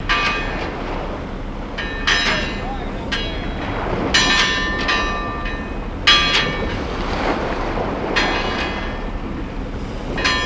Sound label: Buoy Bells, Wind, Water, Voices; Loop